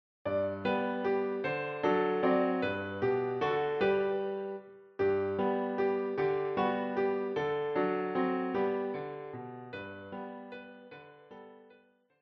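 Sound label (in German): Klavier; Bunt sind schon die Wälder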